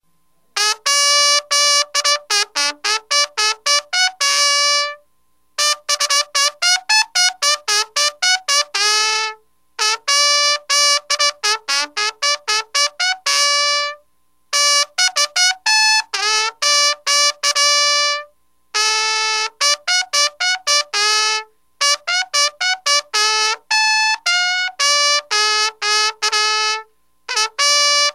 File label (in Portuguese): marcha batida